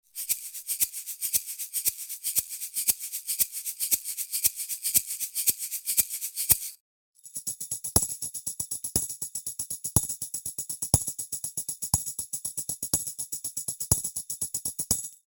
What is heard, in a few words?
Beta87, vocal mics, perc